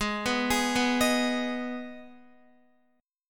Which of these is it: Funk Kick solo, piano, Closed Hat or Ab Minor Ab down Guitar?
Ab Minor Ab down Guitar